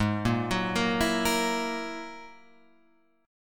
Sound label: Ab 7th Suspended 2nd Sharp 5th down Guitar Open F; Ab7sus2#5 arpeggiated